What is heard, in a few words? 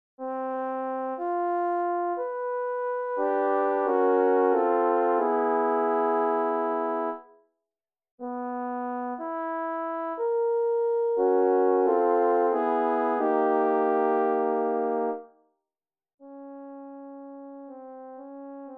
Suite for 3 Horns, midi